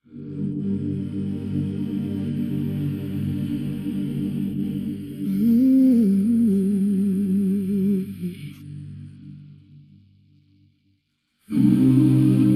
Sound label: stem vocals